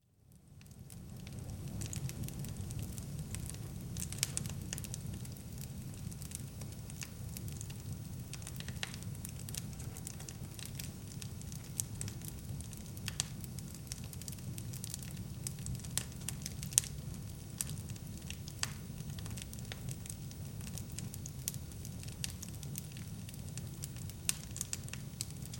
AMB INT Fire; Poetry Project

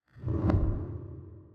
Swipes

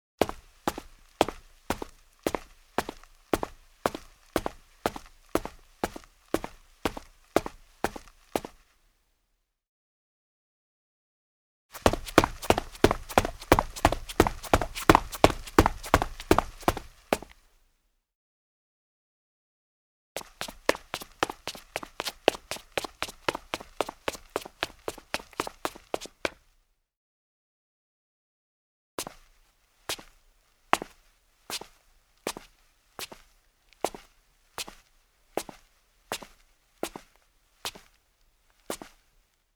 Footsteps, Concrete Male Sneakers

